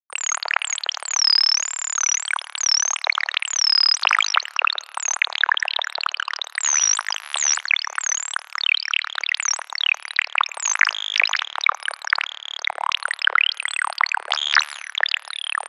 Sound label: Space Machine, 70s Sci Fi